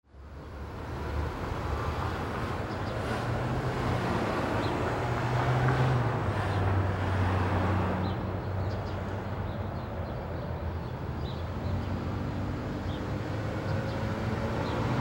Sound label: traffic